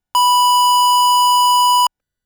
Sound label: Sub B6, keys; fleet keys Samples